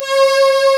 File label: STRG F#4; East West; Global Sounds